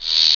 sphere alert; hl1 npc

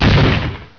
explode

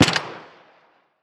m4a1 silencer distant; arccw go

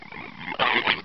zo alert, zombie; hl1 npc

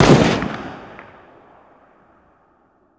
g3sg1 distant; arccw go

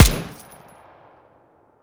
negev; arccw go

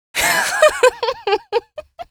laugh

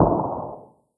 saw distant; hl1 weapon

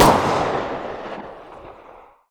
glock18 distant; arccw go

